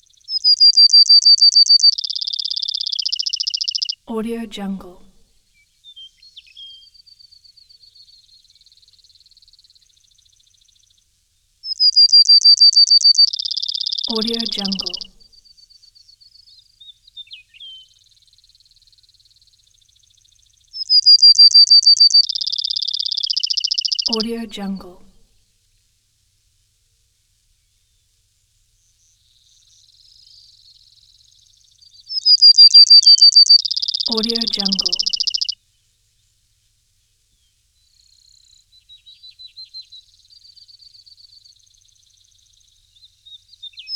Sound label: sparrow chirps